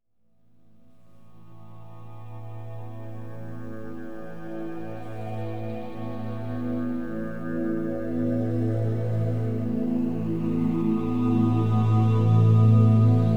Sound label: Delta Waves, Subliminal Messages, Sleep Music; Use Headphones; Deep Sleep